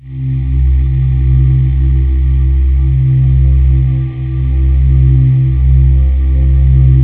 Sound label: JV dark vox, JV-1080; Syntec; Wall of Sounds